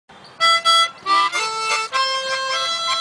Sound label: Harmonica